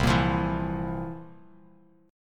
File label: C 11th, C, down, Bass Standard; C11 strummed